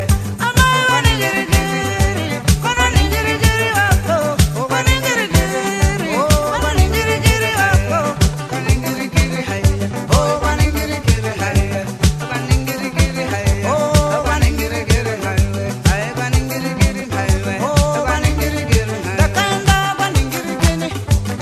latin nights; adjara

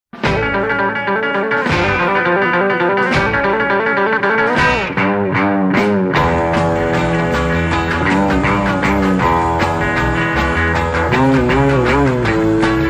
classic rock n roll

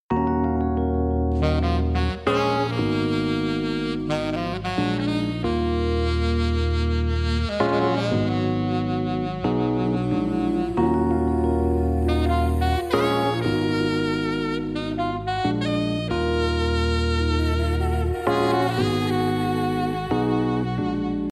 bossanova instr

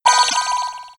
shortmail sound; .hack

